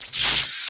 lightning zap; Fieldrunners

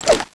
grenade throw; Counter-Strike 1.6

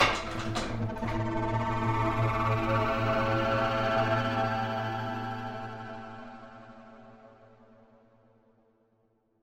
solitaire start; Opus Magnum

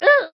unit soldier death; Fieldrunners

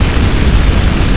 flamethrower attack loop; Fieldrunners

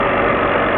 elev loop; Kerbal Space Program

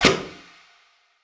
glyph unbonding; Opus Magnum

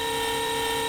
robo arm medium motor; Kerbal Space Program; Serenity

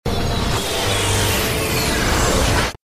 spiral gauge down; Hacknet; Ambient

